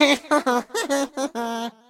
block voice; guodongrenwu

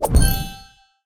levelend star

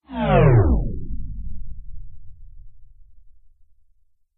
fail; jellyslice

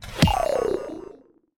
level end fail; zhipai

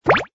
button

